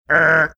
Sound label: making buzzer sound